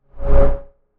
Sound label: GUI Swipe